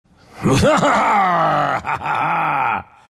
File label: laugh; dota; dazzle